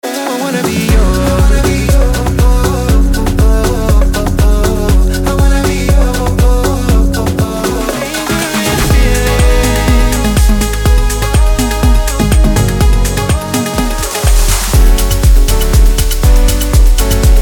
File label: Afro and Melodic House; The Producer School; Tantra Afro and Melodic House Sample Pack